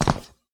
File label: Steps Tile Falling